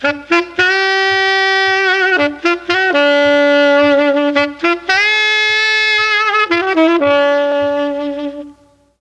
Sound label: B3 SAX, Mellotron; Mike Pinder - Mellotron Archive CD; FOXTROT+SAX